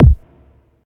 tight lows bass drum single hit d key

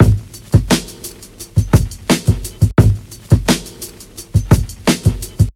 86 bpm drum beat g sharp key; drum loops

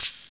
smooth pedal hi hat one shot A sharp

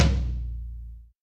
hi tom a sharp key; tom drum

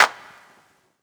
clap single shot d sharp key

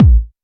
natural disco kick drum single shot a sharp key

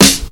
clean acoustic snare g key

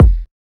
low kick drum f sharp key

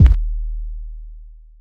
big kick sample d sharp key; Royality free bass drum tuned to the D# note. Loudest frequency: 93Hz

